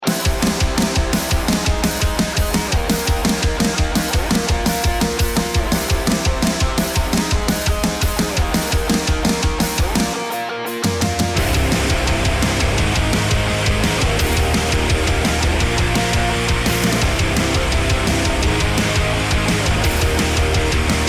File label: start round